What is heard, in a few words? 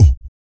Blues Soul; BOOGALOO; Spectrasonics; Vocal Planet